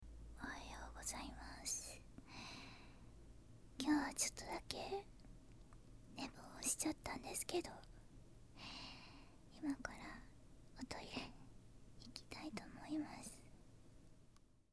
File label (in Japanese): 朝起きておしっこへ; 寝起きおしっこ11日分